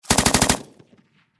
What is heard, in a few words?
Warfare Machine Gun 7.62 Caliber Pkm Russian Short Burst Distant Perspective; Machine Gun Sound Effects; SFX Bible 6